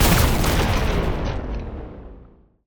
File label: block Explode Electric Big; explosions; Mindustry